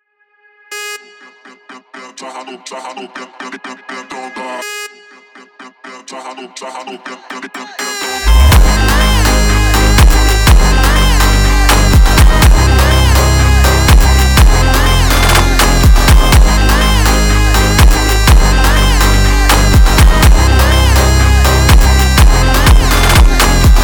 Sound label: Funk